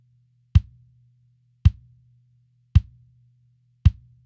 grosse caisse apres egalisation

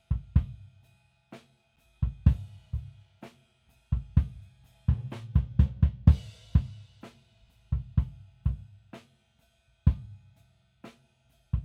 batterie sans saturation

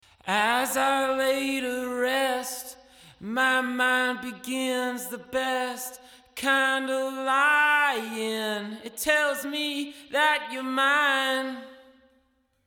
chant avec effet court bright; psp nexcellence

